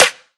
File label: Snare, B; Drums; One Shots; Snares; Cymatics; EDM Starter Pack